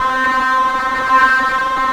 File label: SYNT, 170 BPM; Ueberschall; Drum & Bass Resonance